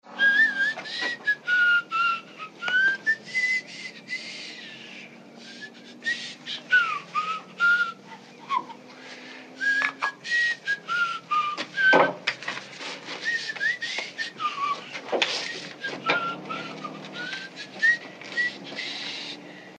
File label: whistling